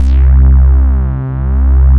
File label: Bass Note C